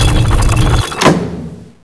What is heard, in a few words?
statue move; statue puzzle